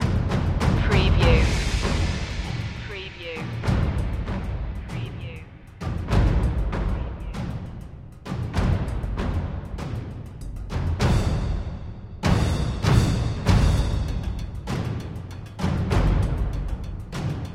perc mix

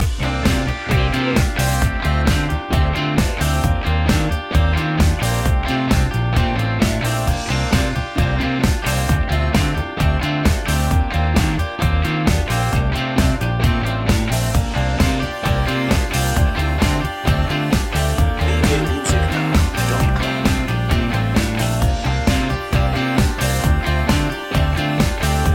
dirty disco loop

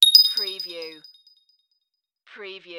Digital Chime Sparkly Ting

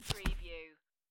Playing Cards Deal Single Card; Turn Or River

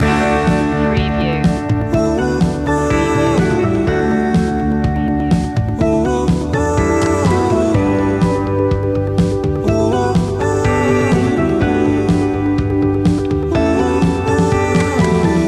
starting something new loop

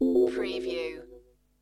Space Machine

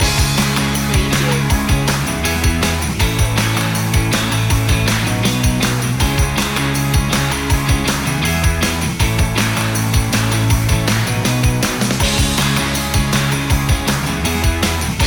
driving fun loop

